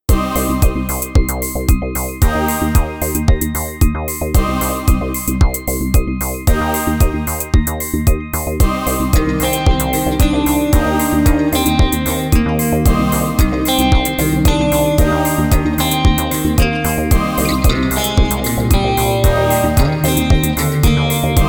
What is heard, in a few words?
Karaoke